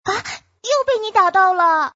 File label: fish die